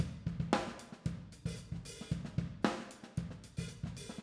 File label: Overheads Notch In